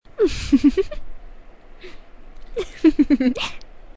Giggling; Vocaroo; Princess Luna

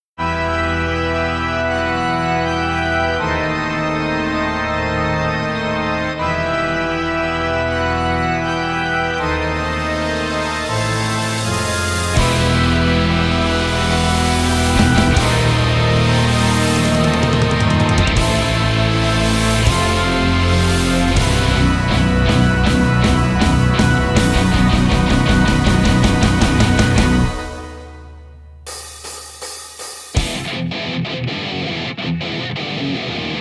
(Instrumental)

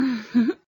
Moan

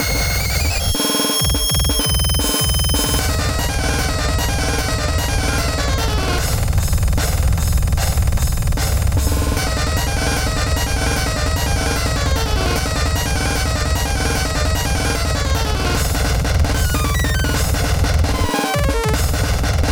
Instrumental

